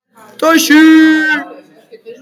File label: nuclear fart